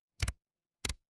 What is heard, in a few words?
ui toggle